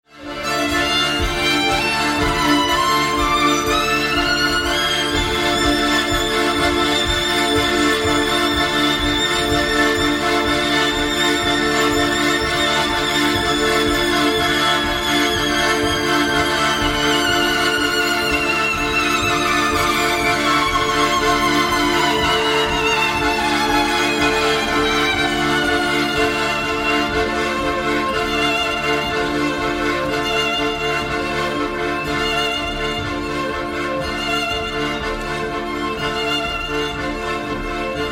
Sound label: vibrato; rukkimestari